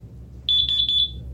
Door

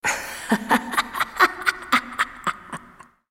Acoustic

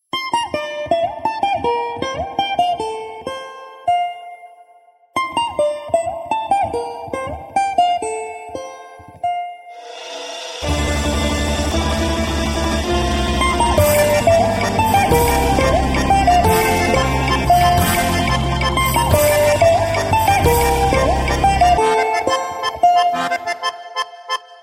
Nokia Classical Tune

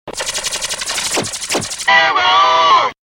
Blazing Saxophone

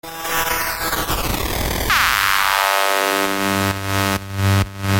SMS sound effect

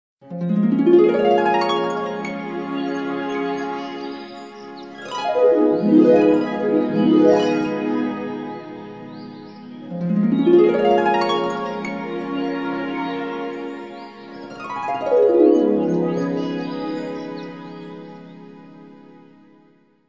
Nokia N95; Fantasia

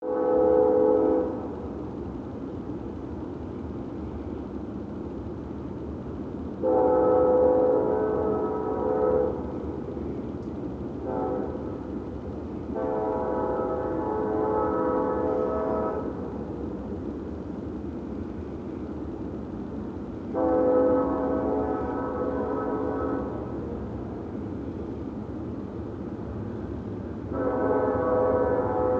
Surprise Train Horn

